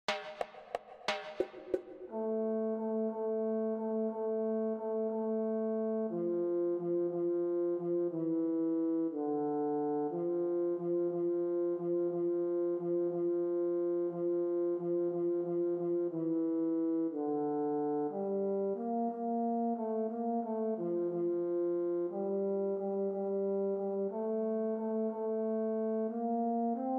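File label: horn with click intro; Spring has come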